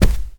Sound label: Rug Run